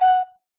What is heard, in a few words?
flute, note; Minecraft; block